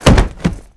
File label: bodyfall wood